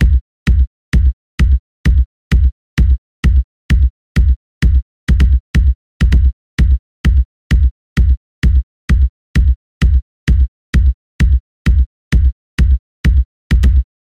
kick drum as israeli tran

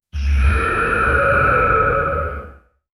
sound of a horrific vampire

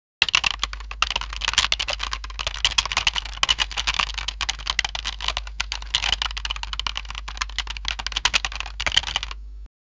popcorn keyboard

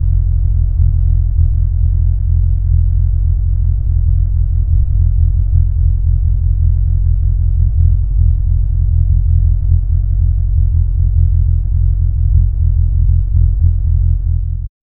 f1 car engine idle sound; race car

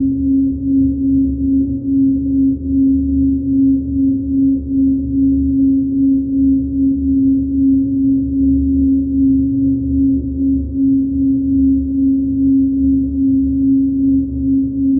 low frequency huma subtle, factory

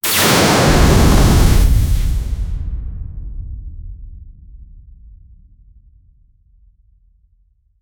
small plasma explosion

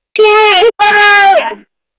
my gird friend die, human emotions

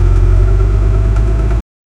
foot hitting a gas pedal; engine sounds

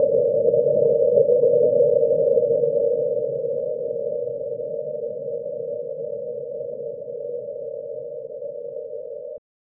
subtle unsettling ambient; atmosphere

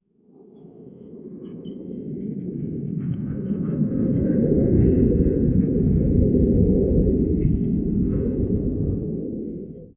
a short quiet sound of, air

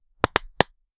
realistic but punchy soun, golf

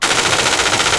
weapons

